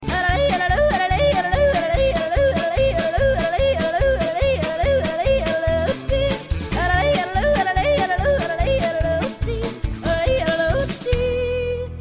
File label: Yodel Girl Cute